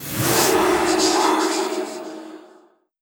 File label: Silence target